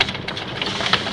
MACHINES

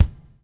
BUBL, BUBBLE; Spectrasonics - Backbeat

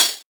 Tamborine, 707; classic machines; 707mod

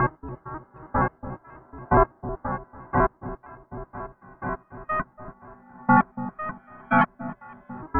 Tape Arp

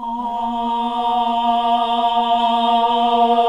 AAH A#1; Best Service Gigapack 1

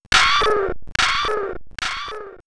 Man Machine "Hiccup", robot vox; X-Static Goldmine

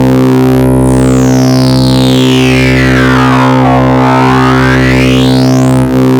OSC BASS, FAT PWMS; Zero-G Ambient 1

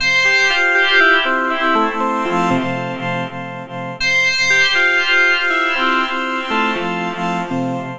Lead, Modular Electronica; Sonic Foundry (Sony Creative Software); TSS Autopilot

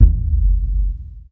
Deep Tank C2, Club Techno FX; Zero-G

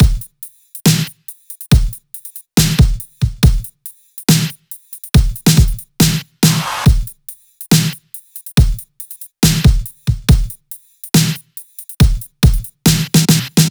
Break Beats, 140 BPM

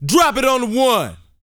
VOX, Vocal, R&B Dance, HIP-HOP; Spectrasonics Vocal Planet

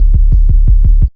DRUMLOOP, Ragga & Dub Reggae

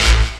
chord A; Techno Trance Essentials; CHORDS

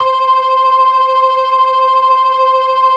LO MAN V L, ORGAN LOWER MANUAL

